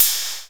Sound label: SYN CYM; DRM FX; E-Lab; X Static Goldmine 2; akai